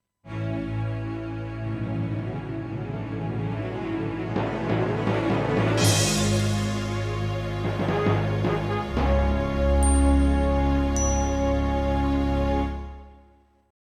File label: SC880; QFG3